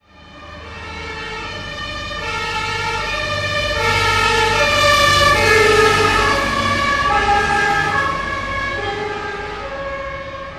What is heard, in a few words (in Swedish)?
utryckningsfordon